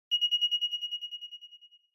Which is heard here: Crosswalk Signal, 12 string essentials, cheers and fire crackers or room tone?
Crosswalk Signal